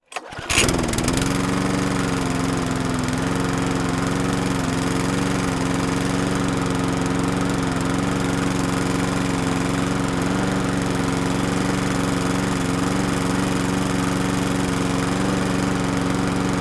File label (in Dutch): grasmaaier